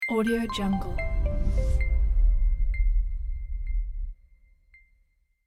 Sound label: opening logo; inthinkingmusic